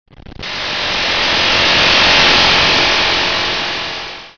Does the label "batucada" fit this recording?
No